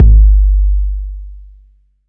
808's

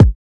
kicks

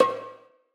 POP PERC; Percs

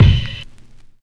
Kick

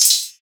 Open Hats

